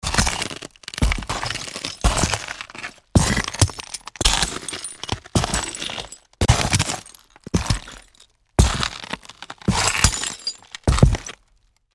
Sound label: Footsteps on broken glass boots walk; impacts; gfxsounds